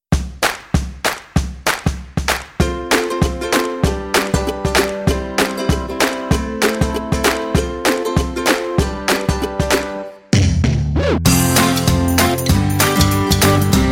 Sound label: Feel Good Trip; SafeMusicList